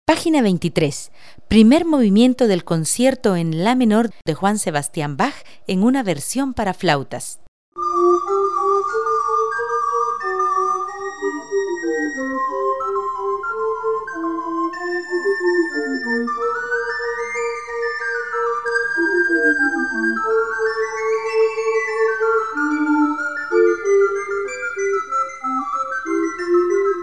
concierto para flautas; Grupo de Flautas Dulces, director Julio Santos

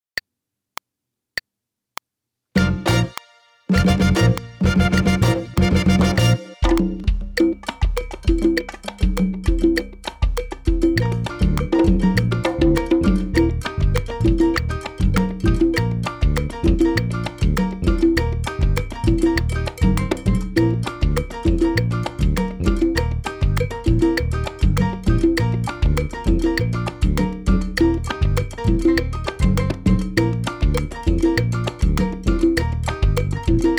MAMBO Pista con metronomo

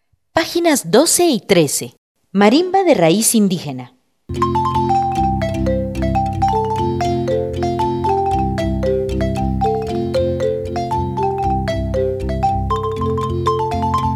Marimba indigena Son; Marimba indígena-Son Zacualpa Tradicional - Marimba de concierto

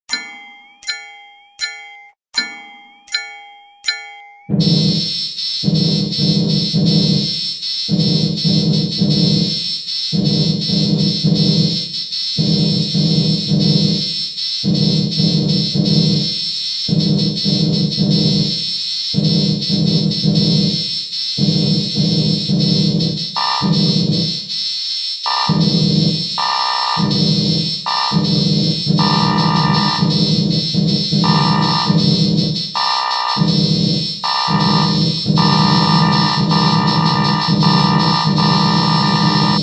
fanfarra

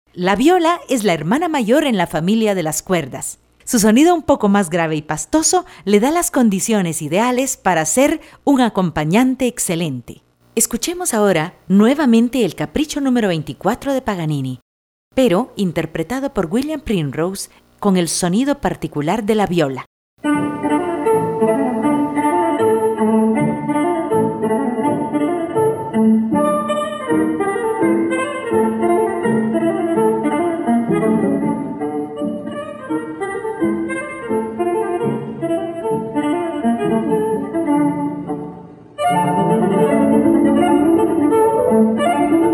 VIOLA; La viola: Capricho No. 24 (Frag.) - Nicoló Paganini

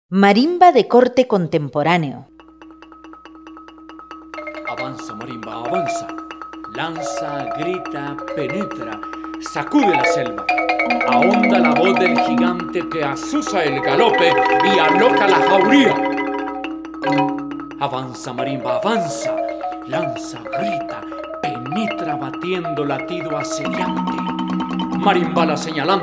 Marimba contemporanea

